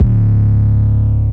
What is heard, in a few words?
808 trappy Eb; 808s